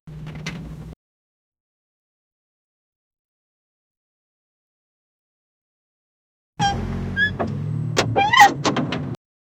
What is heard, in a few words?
voice effect, giggles